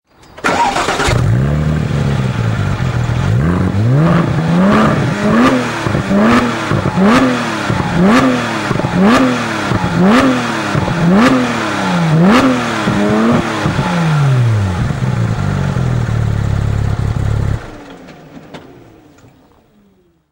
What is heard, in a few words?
gt3 changing gears, porsche